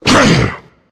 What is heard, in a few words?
charger pummel; player; voice; attack; source engine; left 4 dead 2